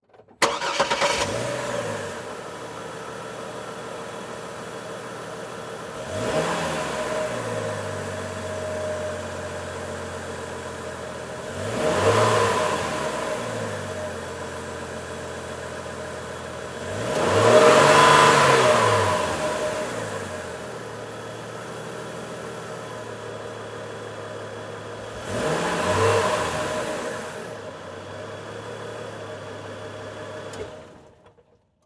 Transportation Truck Envoy Start Idle Rev Off Exterior Short